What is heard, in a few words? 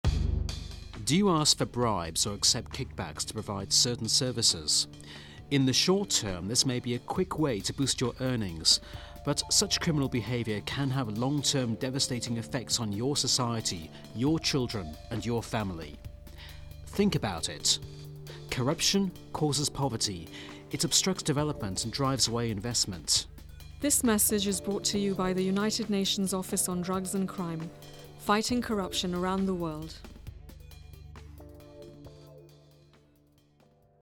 Music